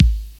prominent bass drum a key, kick drum single shot tuned to the A note; kick drum samples